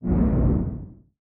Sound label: distance torpedo hit; weapons; Stellaris